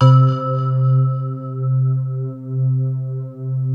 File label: BELL 2 C2; Music Store - Claudius Diemeter Schwerlos; FINE ART 5